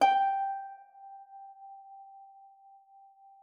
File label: HARP FORTE F#5; Xsample VOL-8